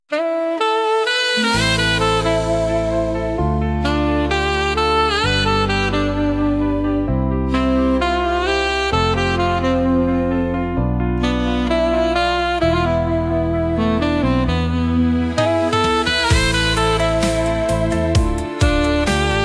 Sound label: golden sax vibes